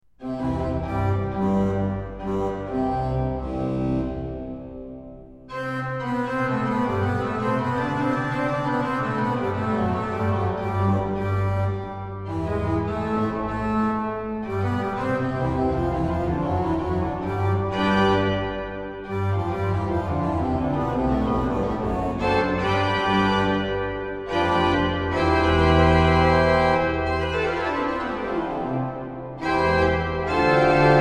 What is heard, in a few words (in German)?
Praeludium C-Dur; Dietrich Buxtehude; BuxWV137